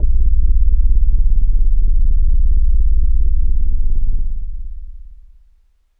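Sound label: Leslie Kawaii C#3, organ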